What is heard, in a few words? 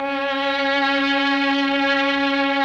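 MELLOSTR, Mellotron Violins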